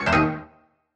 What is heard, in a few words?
scatter Hit; excalibur